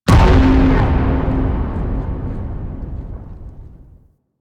Capital Ship Destroy Explosion; Warframe; Vehicles; RailJack Enemy Ships; Corpus

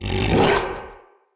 Battle Dog Attack Bite, Animals; Vocals; Snc Battle Dog

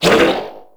Corpus Struggle; Warframe; Amalgam Override

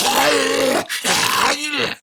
Laugh Grineer Ghoul Sargeant

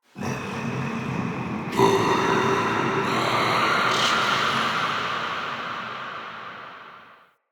Day Texture; Ambience; Glades Of Andurin

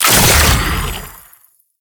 Fuel Projectile Super Pick Up; Warframe; Jade Shadows; Ascension

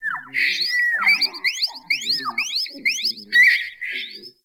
Valley Water Creature; Warframe; The New War; Drifter Camp Valley

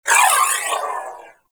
Briefcase Akimbo Pistol Alt Proj Whizzby; Warframe; Corpus

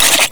Flying Robot Engine Stop; Warframe; Veilbreaker; Ambience; Kahl Quest

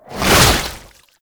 Underground Attack Tentacle Swing; Enemies; Abilities; Warframe; Proto Infested Babau

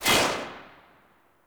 Rifle Shot; Warframe; Tenno; Tenno Bard Rifle

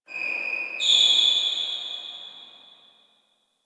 Distant Shoe Squeak; Warframe; Nineteen Ninety Nine

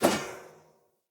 Sphere Polearm Whoosh; Weapons; Player

